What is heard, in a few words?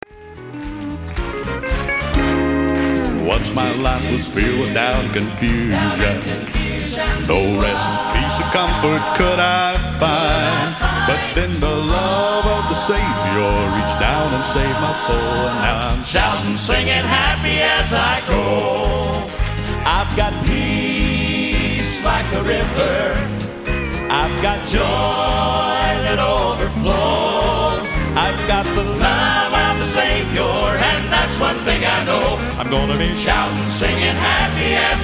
shoutin singin happy